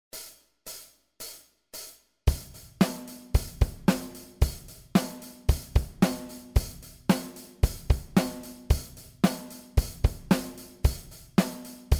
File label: 112bpm, bass